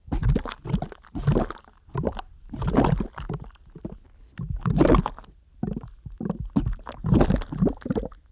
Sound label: ambience water bubble